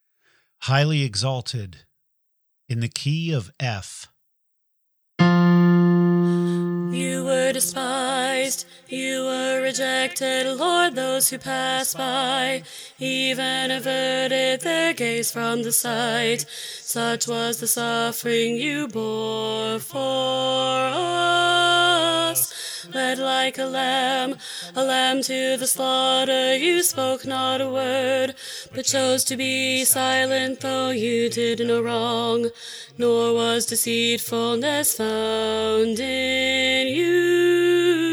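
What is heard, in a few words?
Highly Exalted Alto, choir part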